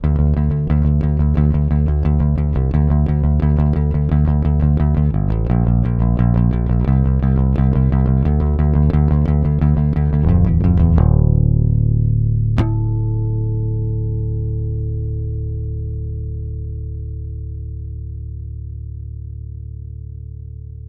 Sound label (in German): plektrum; altiv goliath